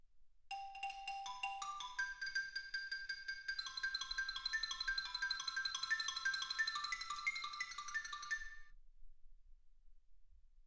xylophone

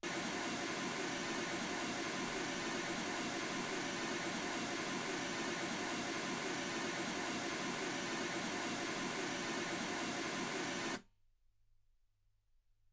mls16 48kHz

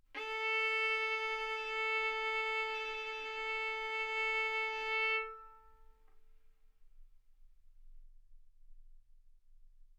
Violin arco A4 B4; mf; sul A; stereo

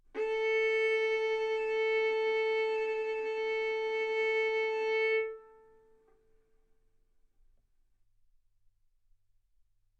Violin arco mf sul A A4 B4 stereo

